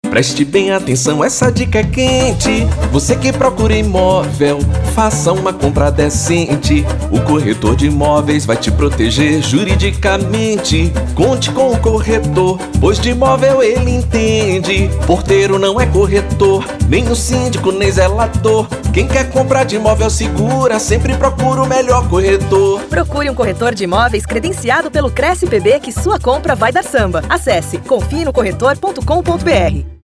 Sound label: Jingle; SuperLiga; Valorização do corretor 2021